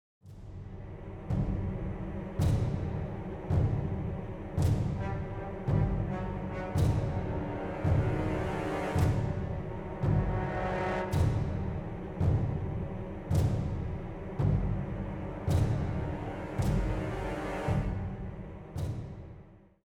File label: Exploration Tension Layer